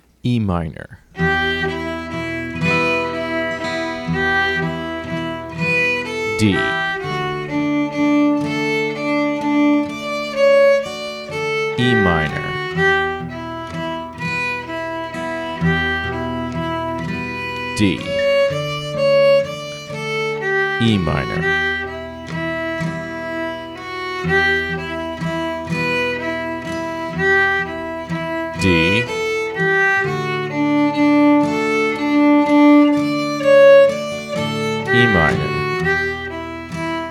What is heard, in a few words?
swallowtail jig slow cues